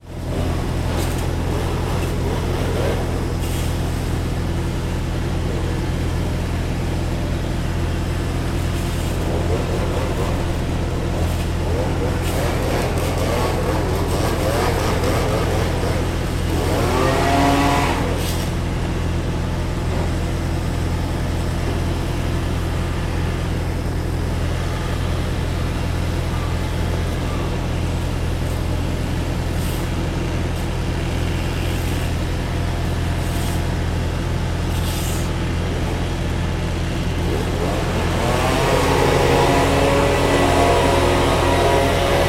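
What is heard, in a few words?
industrial leaf blower operates on street generator in background van idles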